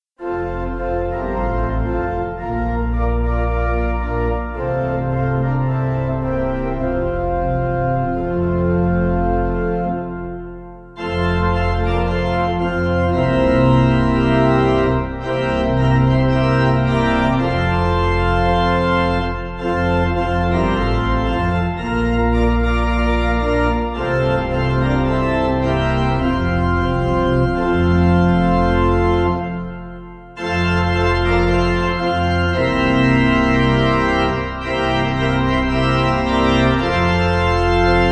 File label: Pipe